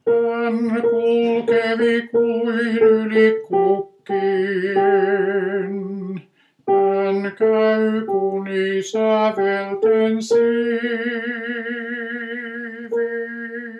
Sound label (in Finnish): tenori, miehet; 2. tenori; Vetelin poikakuoro